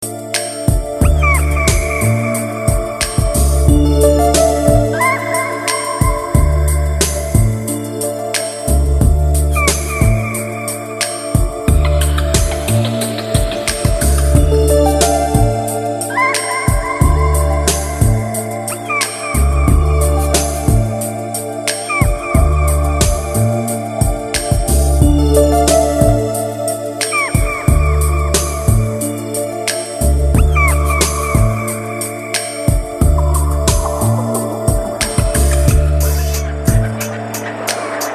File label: seagulls